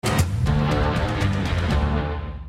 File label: Castle Opening Short